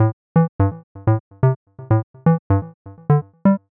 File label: minimal tech house synth pluck loop 126 bpm; 2 bars